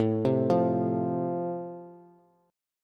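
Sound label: A Minor Sharp 5th, Bass, chord strum; Am#5 arpeggiated; x,12,10,10; JGuitar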